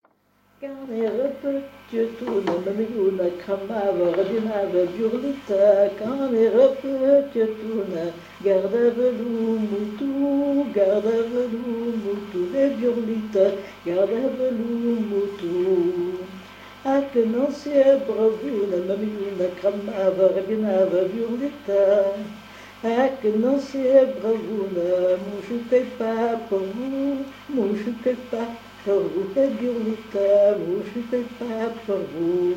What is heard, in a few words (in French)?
Bourrée; Chandezon